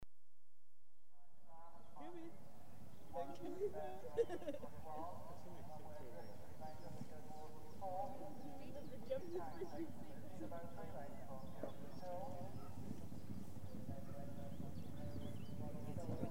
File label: Racing - Large field passes over jump; Equestrian Events; BBC Sound Effects Library